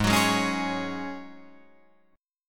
G sharp 7th Suspended 4th G sharp, Guitar Drop D

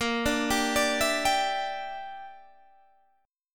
Bb 6th Flat 5th Bb, Guitar DADGAD

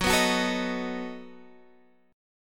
B 7th Gb

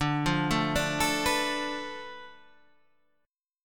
B Minor 7th Flat 5th D, guitar chord

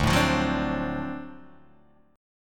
D 7th Sharp 9th D down Guitar Open D

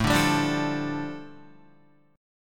A 7th Suspended 4th Sharp 5th, A, down, Guitar; A7sus4#5 strummed

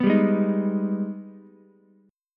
Ab Minor Major 7th, down, Ukulele; AbmM7; strummed

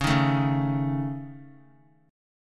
Db Suspended 2nd Sharp 5th Db Guitar; strummed; Dbsus2#5; JGuitar

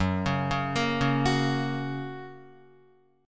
Gb Major, Guitar; Gb arpeggiated; JGuitar

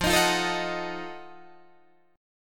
F 7th Flat 9th, Guitar Open D

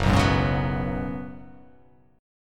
Gb Suspended 2nd Suspended 4th, Chord; Gbsus2sus4 strummed; 2,2,4,1,1,1,1,x

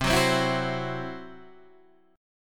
C Major Flat 5th, C, chord

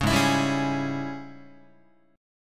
G sharp Major 7th Suspended 4th Sharp 5th, Guitar; JGuitar

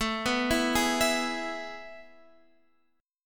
A Major 7th Suspended 2nd, Guitar, Standard; AM7sus2 arpeggiated; x,12,9,9,9,12; JGuitar

